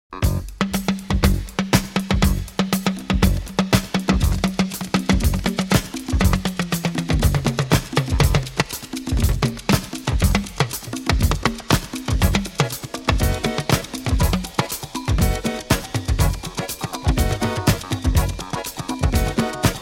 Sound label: Afro Beat